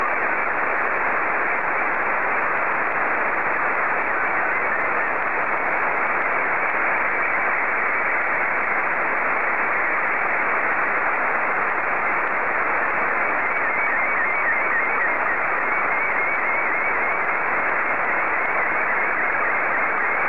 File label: sstv